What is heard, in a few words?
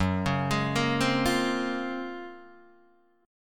F sharp Major Sharp 11th, Guitar DADGAD; chordshape; down